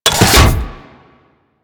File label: Door Close A; Warframe; Ambience; Grineer; Grn Door